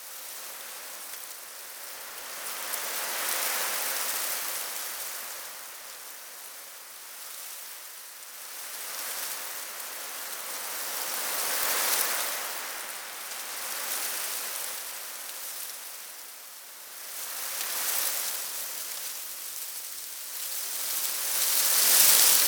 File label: Tree Loop; Ambience; Props; Loops; Eidolon; Warframe; Eidolon Plains